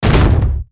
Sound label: Ark Close; foley